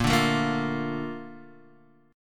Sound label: Bb Major 7th, Guitar, Open D